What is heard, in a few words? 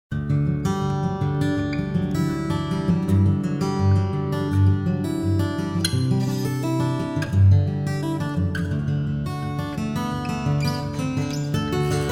Acoustic Guitar LXP Hall